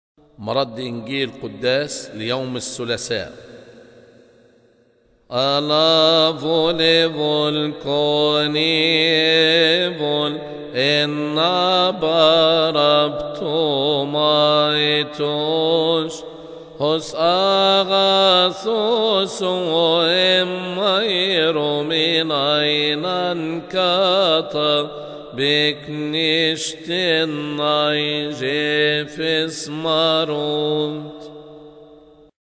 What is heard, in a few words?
Gospel Response; Gospel Response of Jonah's Fast Tuesday Liturgy